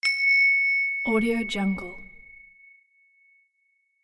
notification bell

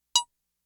blip tone single high pitch synth blip staccato; prosoundfx

